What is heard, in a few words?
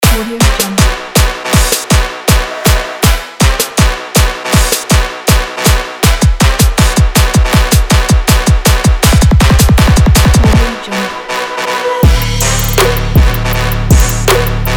sport hard trap